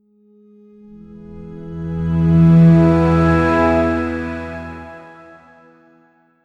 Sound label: podcast transition to ad